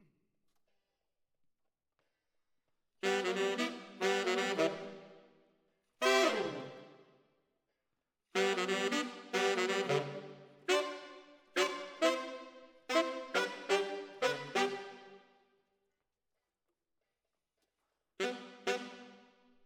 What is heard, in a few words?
ORTF SCHOEPS, piano